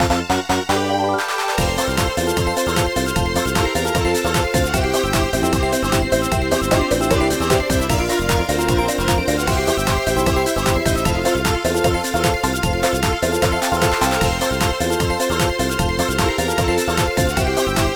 Bgm bonus time day

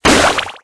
Explosion; Lime Obstracle; cookie run

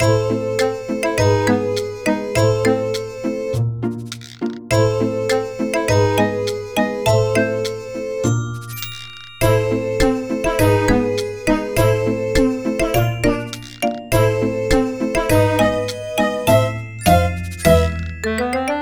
Bgm lobby; land04

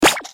Treasure Get; Milk; Choco Sand Jelly

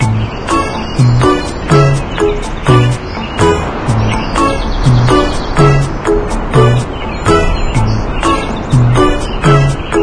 World Map With Ambience; Cookie Run

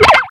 note spreads partial; Cookie Run; Rockstar

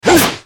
Shot Harpoon; Cookie Run; Salt

